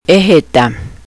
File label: sneeze